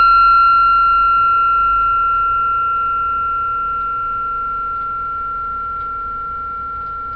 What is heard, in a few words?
ring